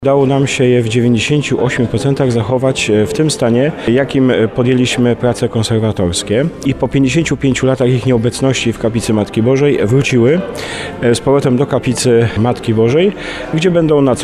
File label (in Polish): organy godzinkowe